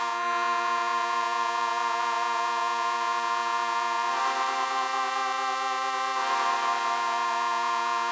ARP 2600 V Pad

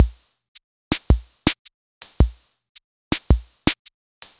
Swing B

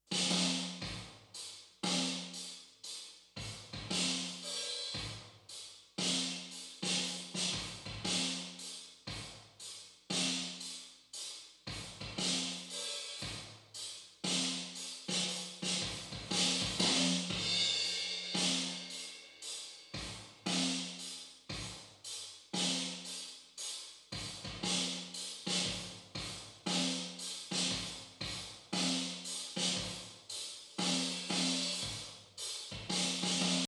drum verb only

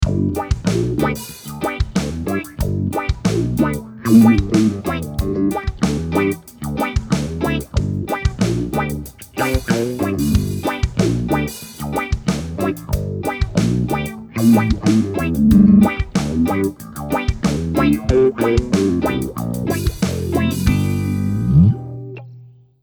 Funk Bass

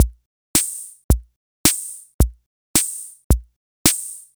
A Foxtrot

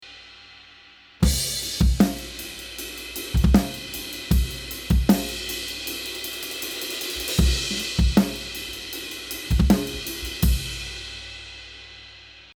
Section B All Mics

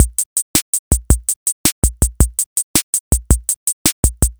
Slow Rock

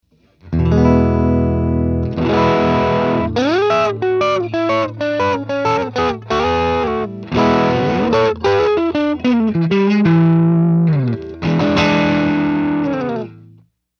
MID GAIN TS9

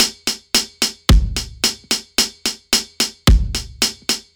One Drop

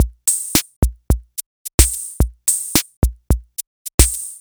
Disco 2 B

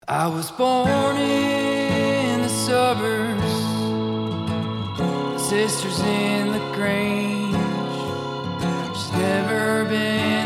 vox and acoustic extra verb

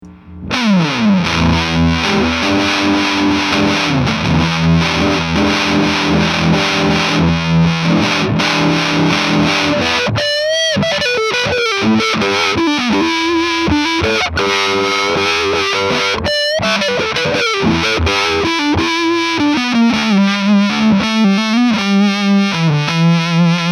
Fuzz; Monument

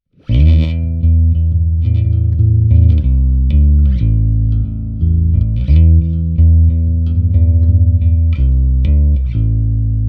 Omega Fuzz Preamp; Sterling DarkRay

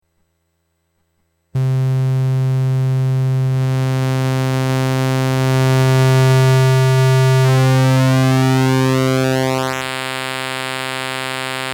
waveform sweep

